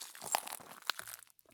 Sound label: turn glass; Escape from Tarkov